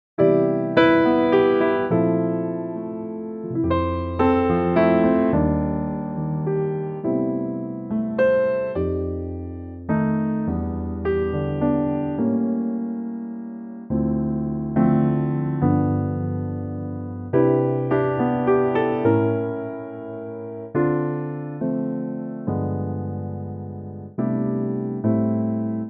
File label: C Piano